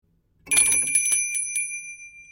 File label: Door Mouted Boutique Bell